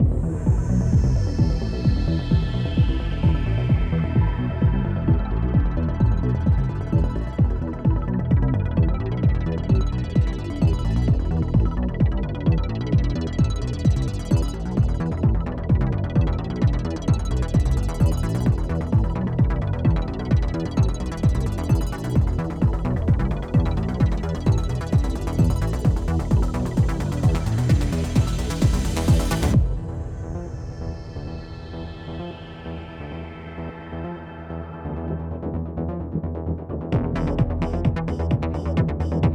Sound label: Dark Electronic Pulse; Metal Unit; Audiojungle